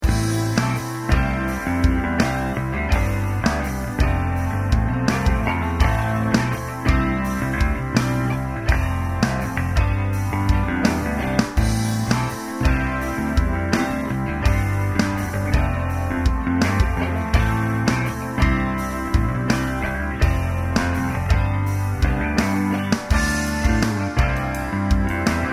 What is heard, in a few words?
Rock Mix Speakers